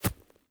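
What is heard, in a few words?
grass, steps; Terrarum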